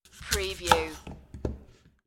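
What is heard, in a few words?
Cutting Crisp Produce Quick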